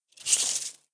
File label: make baskets